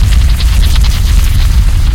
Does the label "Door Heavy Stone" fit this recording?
Yes